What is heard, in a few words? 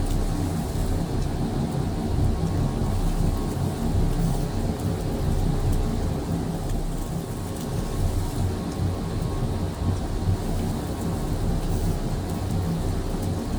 ship destroyer idle; Stellaris; machineage